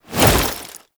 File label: Gap Close Jump; Butcher; Warframe; Lasrian Soldiers; Foley; Stance